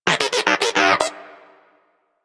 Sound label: Moa Inquisitive Vocal Agree; Warframe